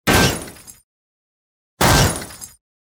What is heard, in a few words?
Metal Heavy Punch With Crunch And Glass Debris Sounds